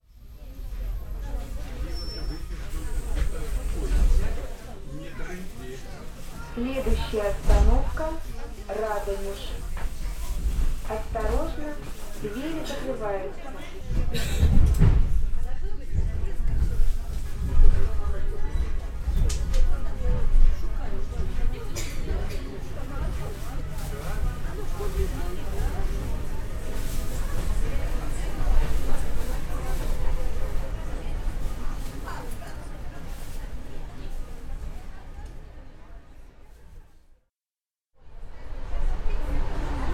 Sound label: train, abramtsevo